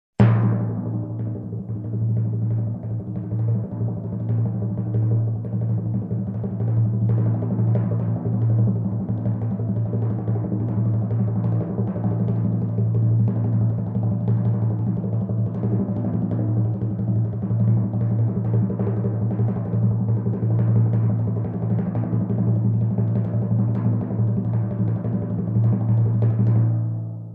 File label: Timpani holding tension